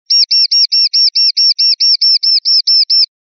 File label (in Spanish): titeos